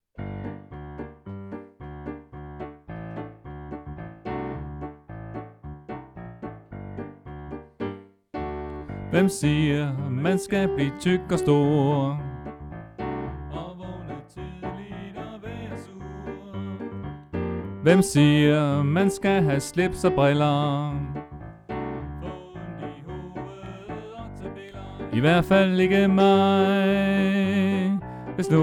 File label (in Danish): Sopran; oevefiler